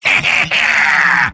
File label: battle cry; soldier; mvm